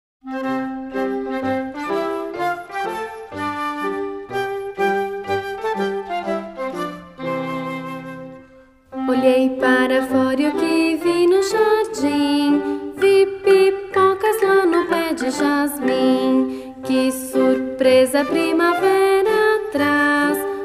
words and music, portuguese; popcorn popping; childrens songbook

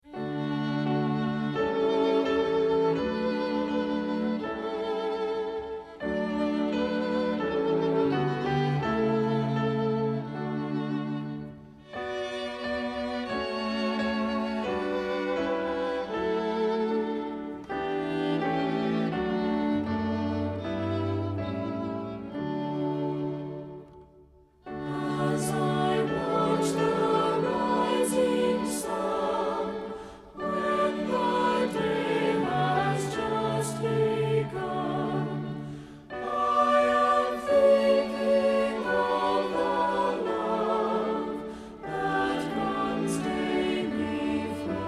vocal and instrumental, hymns

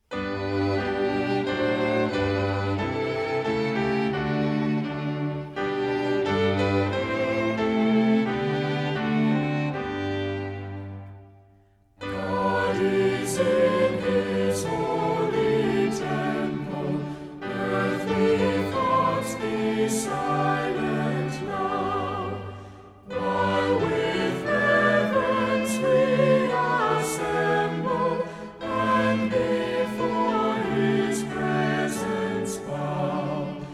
vocal and instrumental, hymns

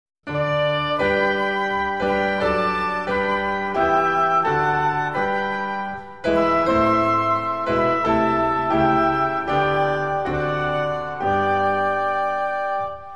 vocal and instrumental; hymns spanish

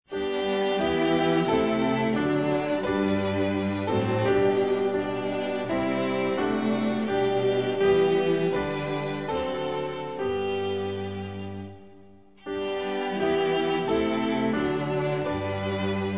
lead me into life eternal instrumental; hymns french

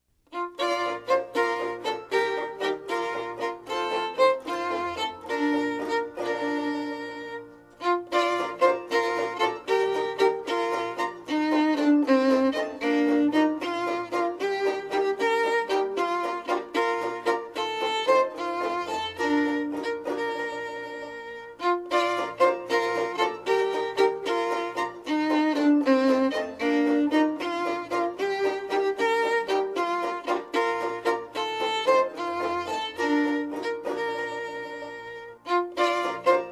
music only; childrens songbook; Chinese

